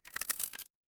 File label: glass crack